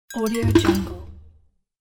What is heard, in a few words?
percussive; fxprosound